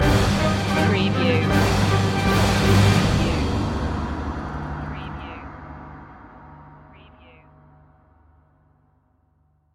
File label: battle march stinger